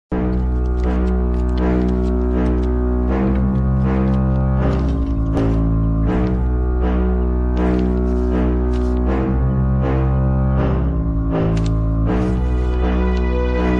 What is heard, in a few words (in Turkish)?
dizi muzikleri; sefkat tepe; endise